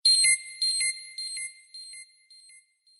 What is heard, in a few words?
Delay Synth